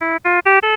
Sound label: Caution, alarms